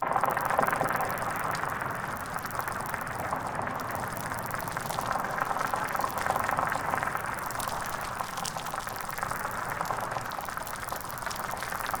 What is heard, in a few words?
Spider Crawl